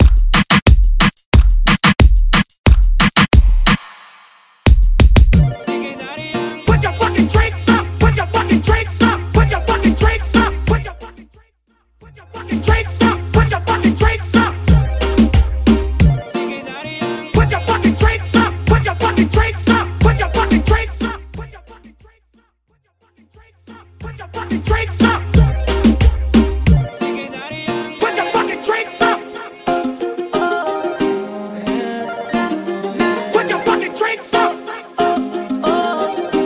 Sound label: Reggaeton Mixshow Hype Into Re Drum 90 Bpm